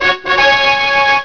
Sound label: Trumpets; BabyLabyrinth 1.0.4; Maze